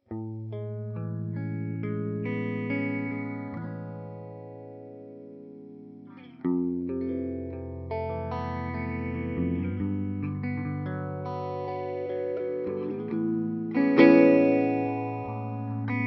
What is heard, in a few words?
GTR Phase 90, The classical four stage phasing effect, On electric guitar